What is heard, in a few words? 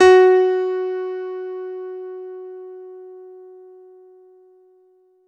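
F#3 DANCE, ANALOG SEQ, Roland JV 2080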